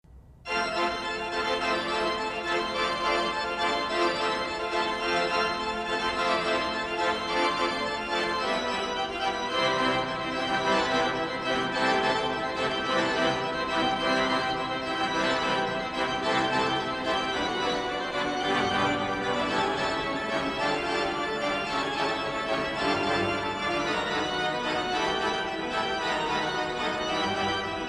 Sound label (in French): orgue extrait